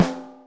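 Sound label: high metal; Pro-Rec - Synthsonic; HIP HOP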